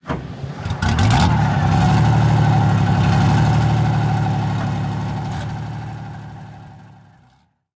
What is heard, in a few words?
tank engine start; War Thunder